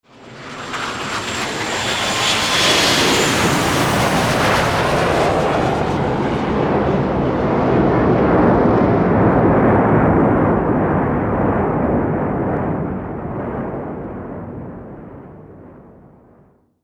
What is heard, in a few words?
jet passing